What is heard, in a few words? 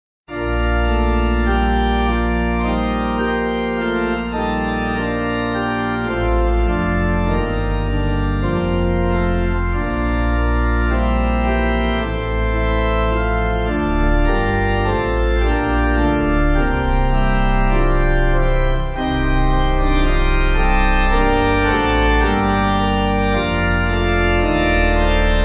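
Pipe, Pipe Organ backing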